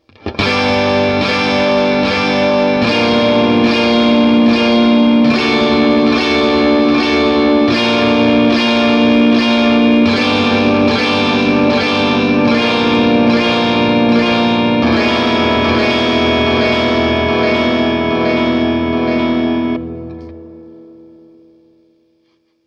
jazzmaster delay; Swart AST

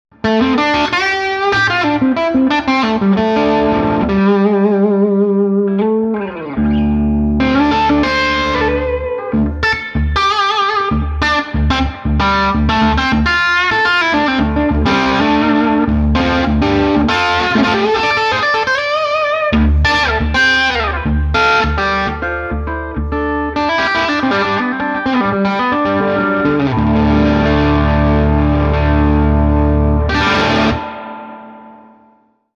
SG Grind; Gibson SG-Grind 1; super 30

